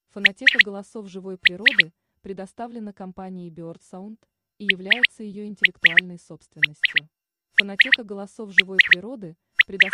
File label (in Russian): Перепел; QUAGLI